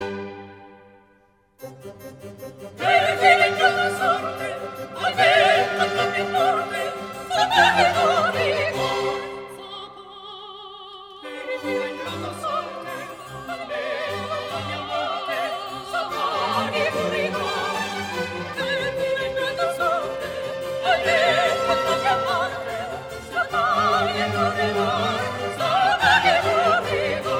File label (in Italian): tenore; Bellerofonte; terz finale III